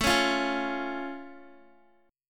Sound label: A Augmented A down Guitar; A+ strummed